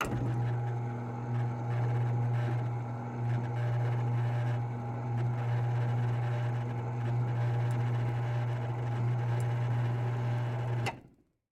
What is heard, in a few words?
Projector screen, automated, motor, canvas, roll down, release; Eiravaein Works; Vaeyan IV